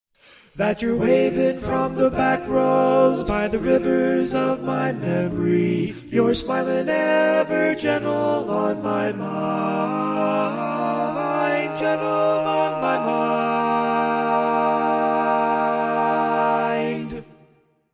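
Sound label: baritone, barbershop tag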